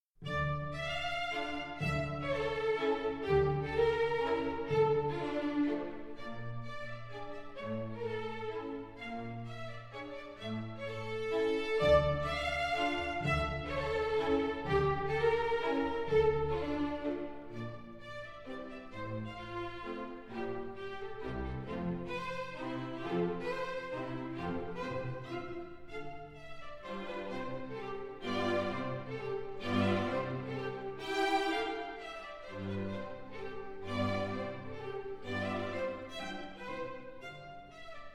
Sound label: mazurka